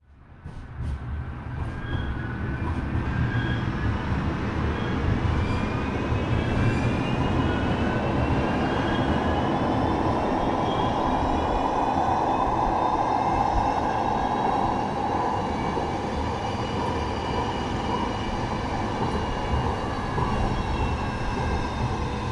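tram interior high speed screeches stopping, uk big city interiors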